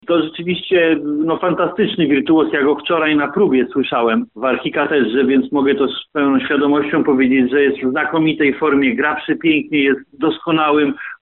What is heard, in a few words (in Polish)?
organy